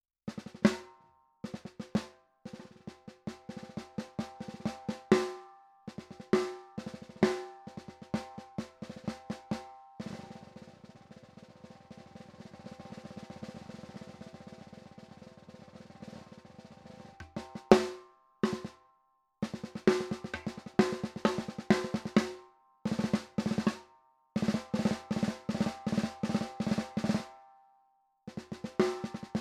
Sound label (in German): PDP Snare Gross hohe Stimmung